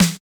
DANCE SET, DRUMS

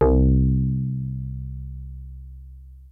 PHAT BASS; BASSES; Kid Nepro; Elite

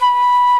WOODWINDS

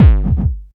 HD BD, Hard & Loud Techno

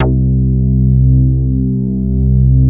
C3, BASS; Q Up Arts; Dream Experience; BASS BANK 12

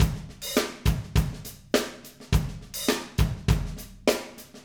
Acoustic Live Drum; Platinum Audiolab

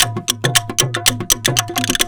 KARTH, Indian Traditions; USB Soundscan vol.45; AKAI

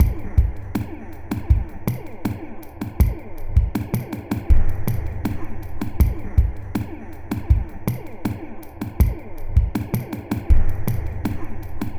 Darkwave, Processed Percussion

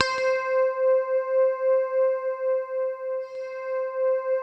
C5, SynthLine; 90 s Sample CDs; NiceBeats; SynthLine VOL-33

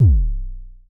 Mild Thump Kicks B0, Vermona Kick Lancet; LookIMadeAThing Kicks; Mild Thump Kick - Precise

